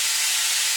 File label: Steam Loop